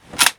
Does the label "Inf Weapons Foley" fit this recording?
Yes